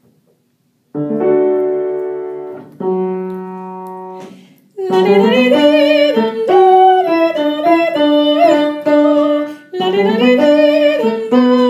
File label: TENOR